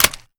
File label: hit; tacz; daffas arsenal